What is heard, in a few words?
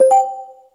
button; actmod